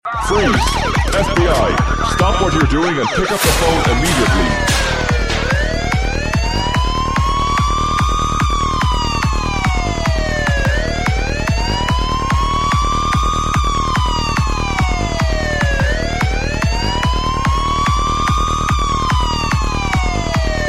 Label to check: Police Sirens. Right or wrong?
Right